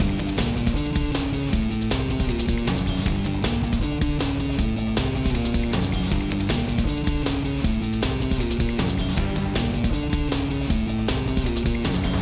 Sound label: Tornado Combat MIXED; Ben 10